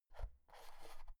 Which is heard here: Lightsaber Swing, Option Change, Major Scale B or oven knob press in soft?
oven knob press in soft